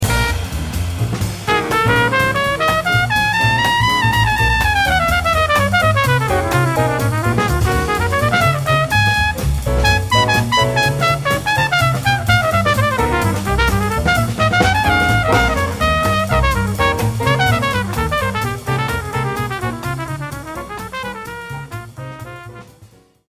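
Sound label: trumpet solo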